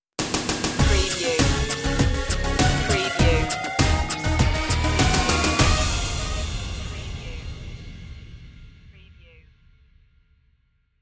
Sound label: deep impacter stinger